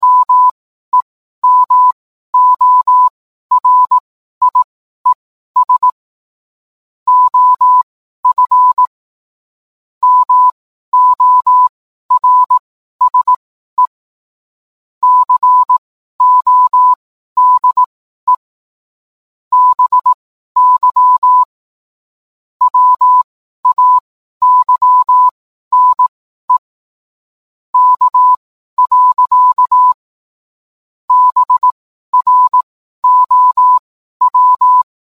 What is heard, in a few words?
morse